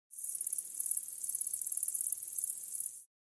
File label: Insect chirping jungle ambience